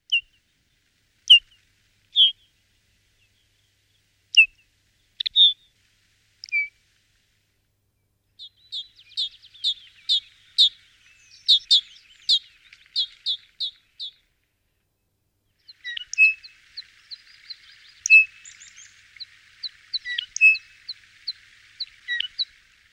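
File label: podorozhnik golos, Lapland longspur voice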